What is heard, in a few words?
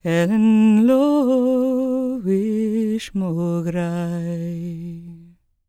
GAELIC, World Voices, GAELIC FEM